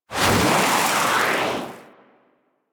Idle Noble Breath Fire; Warframe; Warframes; Dragon

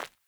Sandals Run Default, Footsteps; Characters; Player

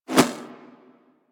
Throwing Star Whizzby; Warframe; Weapons

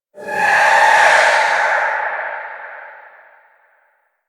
Rell Specter Vocal Death; Enemies; Warframe; Priest Quest

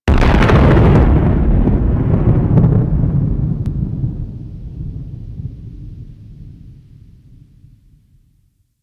Explosion Space Battle Distant

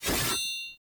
Two Handed Axe Whoosh Stab; Weapons; Enemy; Axes; Sinecure Two Handed Axe

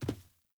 Sandals Land Dirt, Footsteps; Characters; Player

